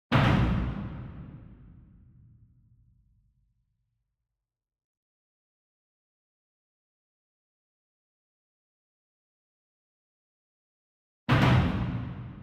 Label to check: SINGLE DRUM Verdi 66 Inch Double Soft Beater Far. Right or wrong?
Right